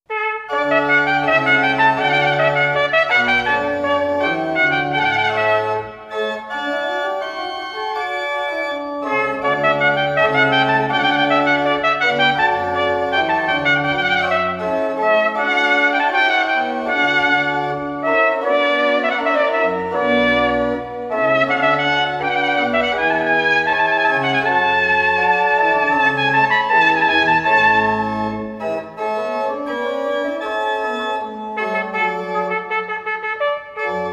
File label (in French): air trompette